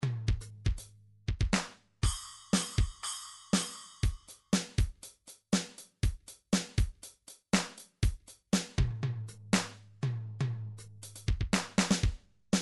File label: drums dance, midi drums